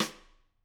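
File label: Snare Stick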